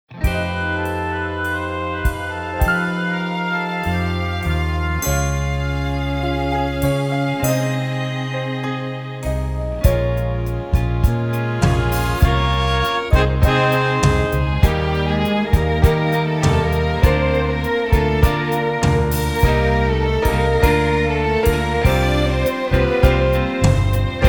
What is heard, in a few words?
transition to detention accompaniment